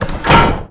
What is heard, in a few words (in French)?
trappe